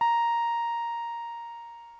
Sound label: bbm, Omnichord; OmnichordGodot